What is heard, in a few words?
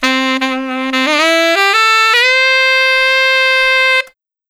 Tenor Sax; Sonic Foundry (Sony Creative Software); Jazz Solos and Sections; Tenor Loops